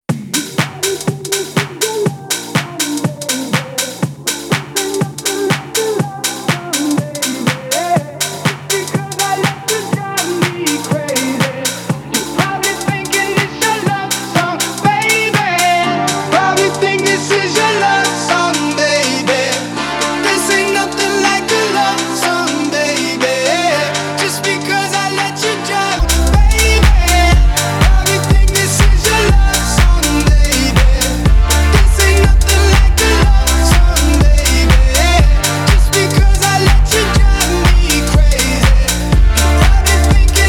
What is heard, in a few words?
Remix